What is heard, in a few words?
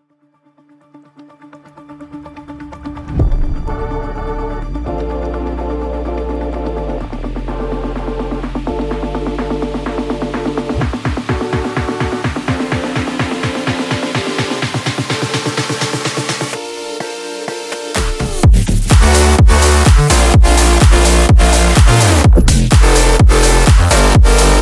Construction Kit Full Mix